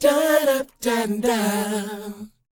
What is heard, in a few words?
DOWOP D#; ILIO; Vocal Planet